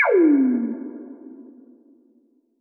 Lazers

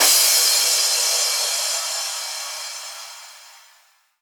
Cymbals Crash; Vengeance essential club sounds-3

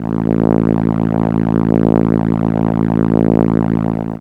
Contra A1, ARP Solina, contra bass; musicradar-synth-samples

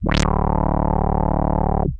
Arp D D1, ARP Odyssey, synth samples; musicradar-synth-samples

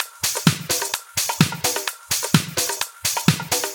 Latin House Loop 128 BPM; Vengeance Samples

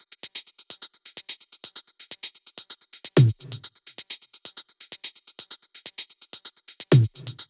128BPM Big beat Kit; Vengeance Samples

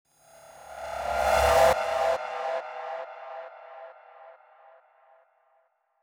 ReverseFx Clean 138BPM; Sound FX; Gminor

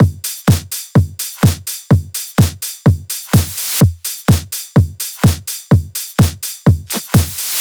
Kit 12, 126BPM; Purple Kit; Vengeance Samples

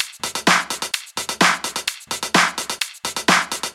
Minimal Loops

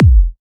Bassdrum; Vengeance Samples

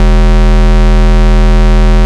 Root E, Bass; Vengeance Samples; Vengeance Ultimate Bass

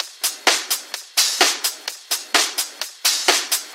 House Loop, 128 BPM; Vengeance Samples

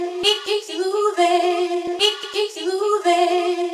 House Loops, Vocal Loops House 128BPM

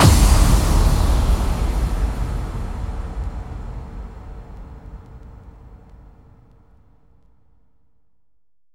Bassdrums FX, FX Kicks; Vengeance Samples

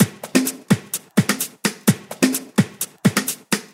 Percussive Loops 128BPM; Vengeance Samples

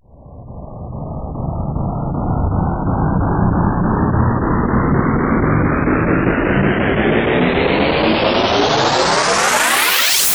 Sweeps Long

